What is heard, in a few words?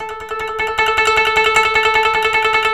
KOTO TREM